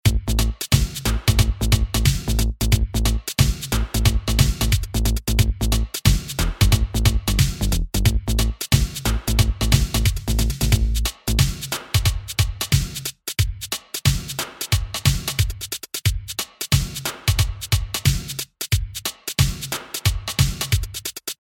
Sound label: linndrum